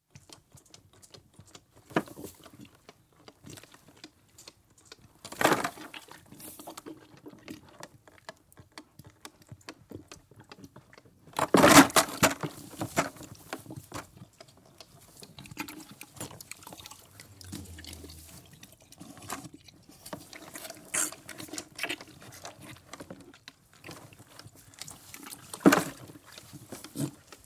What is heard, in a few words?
Cattle, interior, young calves feeding from buckets in sheds, one sucking through teat out of bucket (close perspective), calf scampers in straw; young calf moos, older calves moo, with occasional dogs in distance; BBC